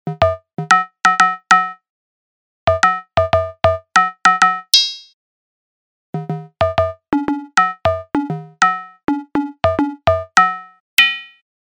metallic; Sidekick

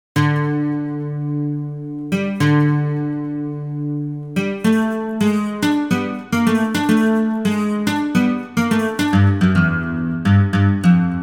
guit con all ch del rev

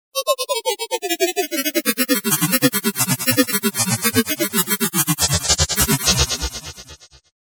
Laser Hi, Chromatic hits

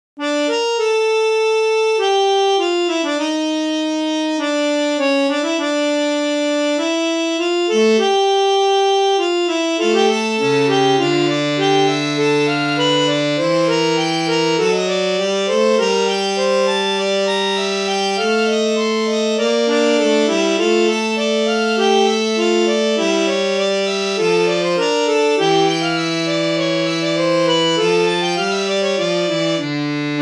MicrOrgan open; Reeds

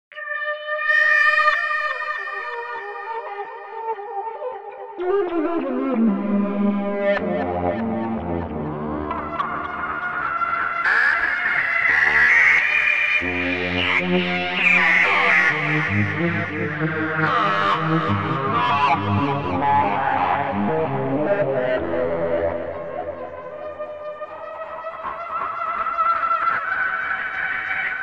lead distorto voyce; Zvon horn M42 Nebula

